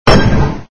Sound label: shieldhit; Red Baron